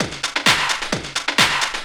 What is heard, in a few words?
techno, DRUMLOOPS; Ueberschall; Techno Trance Essentials